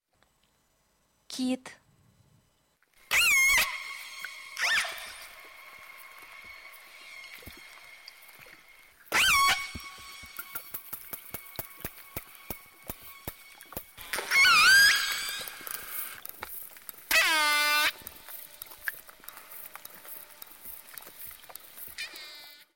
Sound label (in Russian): Кит